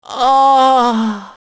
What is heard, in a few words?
DIE; BattleSNDS